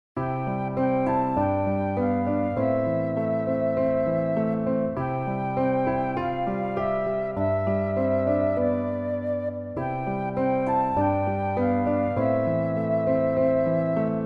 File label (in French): Je te salue Marie (instr); chants